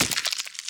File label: obstacle dune